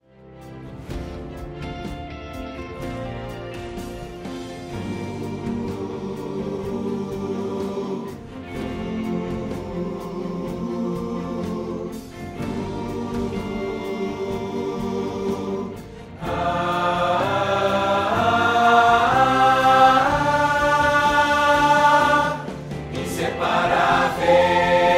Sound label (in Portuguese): baixo; coral; kit baixo; coral novo canto